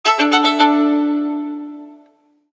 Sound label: Msg Deciso; Vertu Constellation T